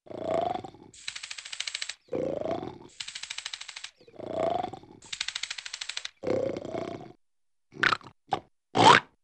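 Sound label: Funny Man Snoring with short Snorty Inhales; Hollywood Edge; Cartoon Trax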